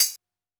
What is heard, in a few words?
Tambourine, 707